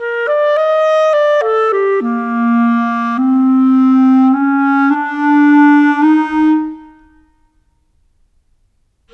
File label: Clarinets, Cinematic; Sonic Foundry (Sony Creative Software); Orchestral Series VOL-3 - Cinematic